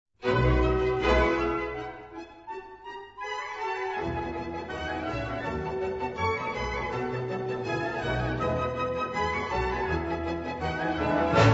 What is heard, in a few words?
Polka; Op. 281 VergnAgungszug (Luxury Train)